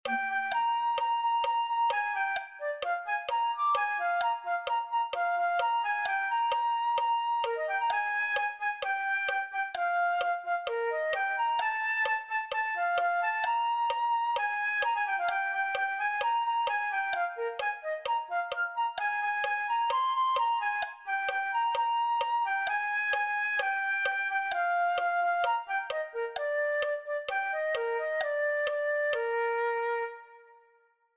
flute

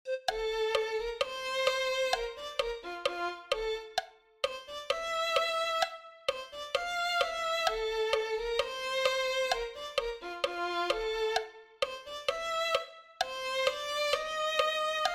violin

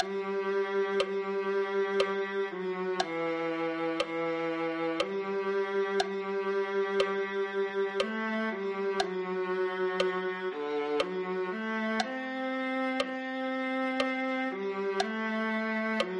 viola

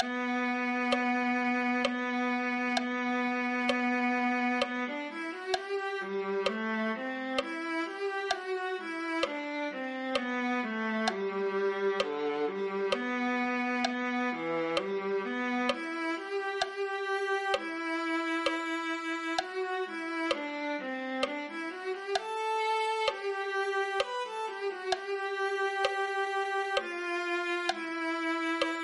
viola

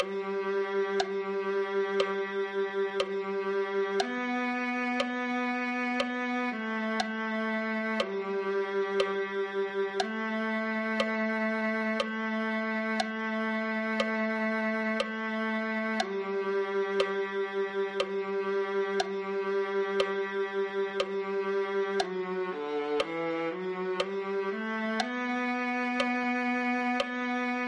viola

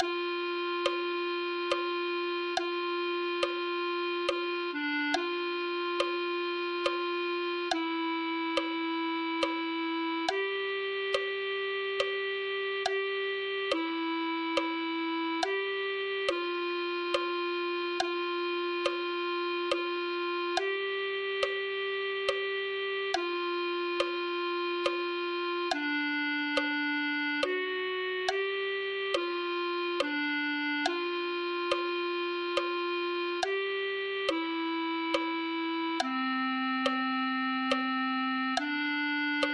clarinet

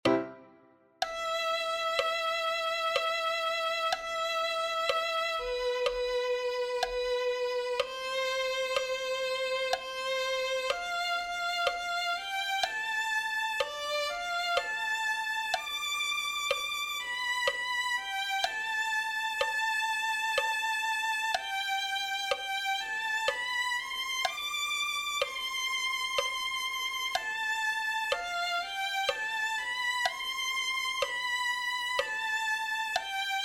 violin

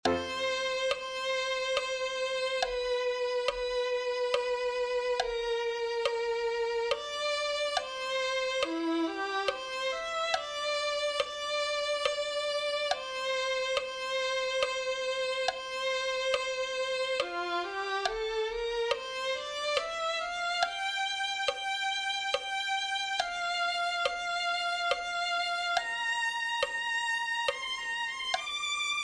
violin

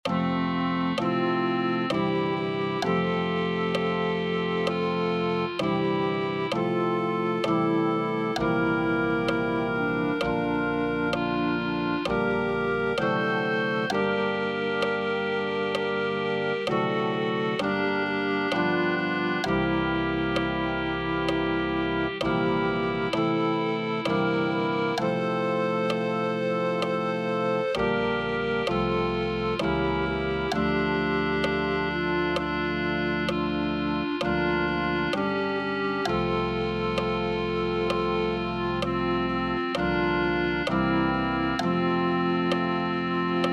clarinet

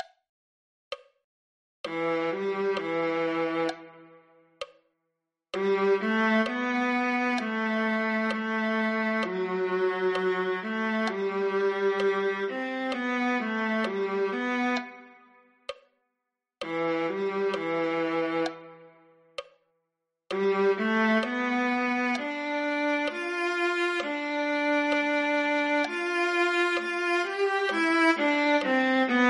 viola

